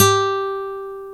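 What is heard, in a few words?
nylon guitar, Brazil samba; Roland L-CDC-01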